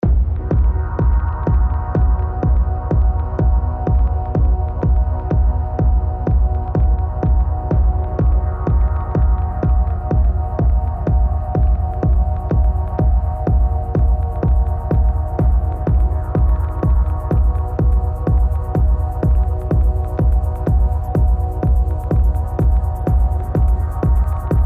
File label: techno live sets, techno spring mix